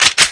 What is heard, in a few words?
deagle slide